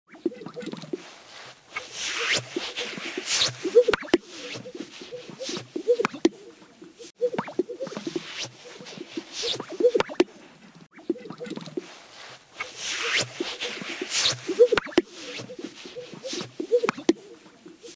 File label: Sage grouse whoompa whoompa